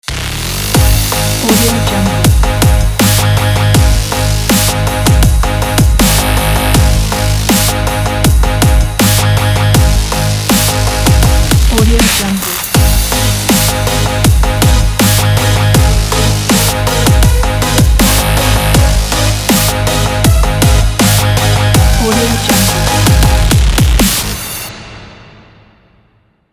gritty dubstep; davyd3

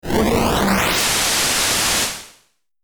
pixel hyperspace hiss

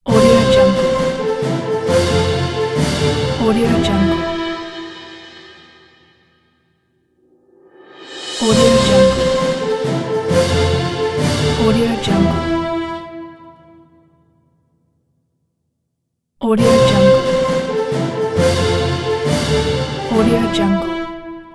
epic fanfares jingle logo

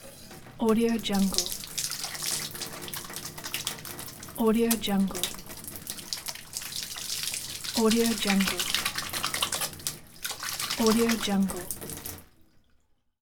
washing hands

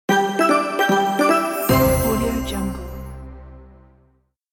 level complete

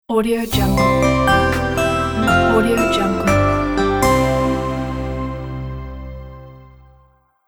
happy bells logo

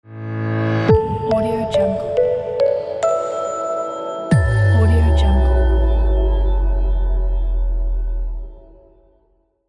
market logo

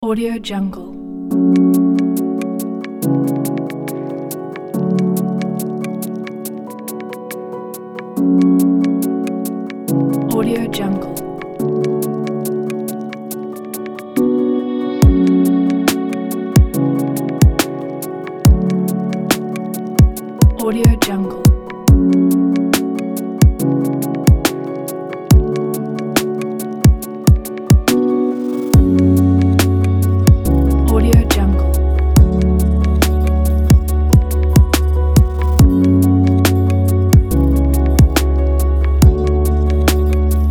glitch cinematic downtempo

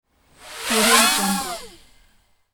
little drone flyby

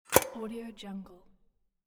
closing metal box

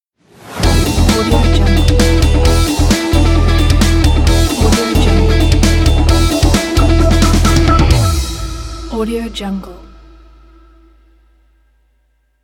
dynamic rock logo

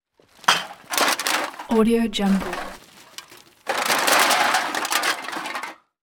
cans into bag

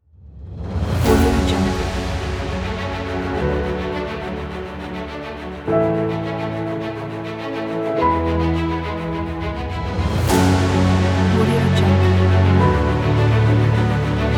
epic; eliansproductions

